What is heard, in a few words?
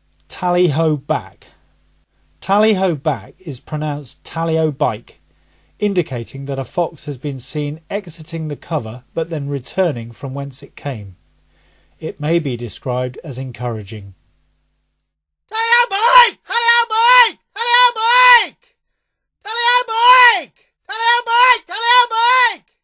"tally-ho back", calls